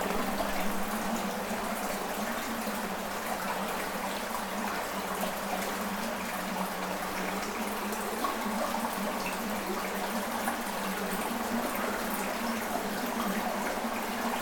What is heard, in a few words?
limestone cave; nature